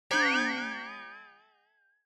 Negative Low; Onoma

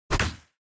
Motion Grab Dry

